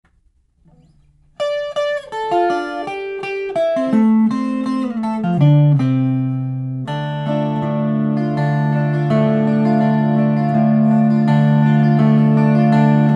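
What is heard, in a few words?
12 string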